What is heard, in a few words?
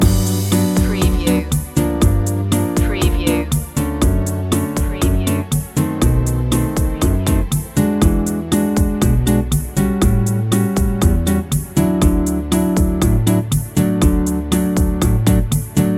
happy squared loop